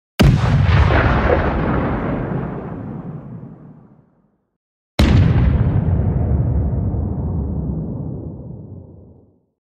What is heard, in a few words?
Heavy impact of a artillery firing